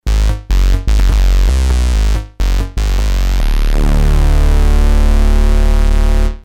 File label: bassy, synths; PEAVEY PARADOX